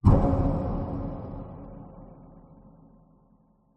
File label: impact sound design wood heavy thump clunk reverb resonant; Skyclad Sound